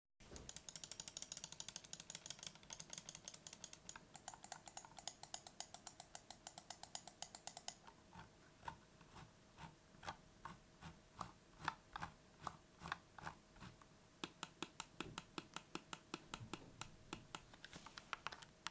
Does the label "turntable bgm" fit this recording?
No